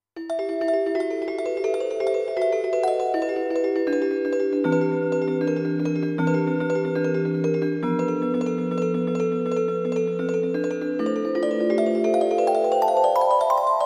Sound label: Soft Crystal